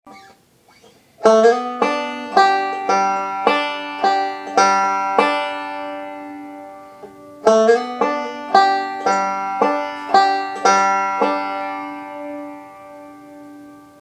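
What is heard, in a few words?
lick, banjo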